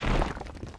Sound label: death; FlyForInsanity